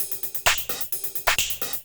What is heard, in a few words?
130 trance break, Drum & Perc Loops; Trance Explosion Vol1